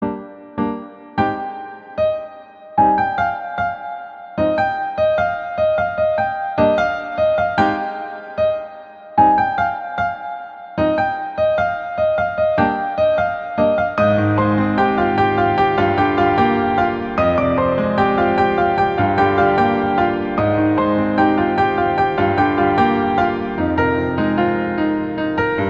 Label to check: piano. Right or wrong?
Right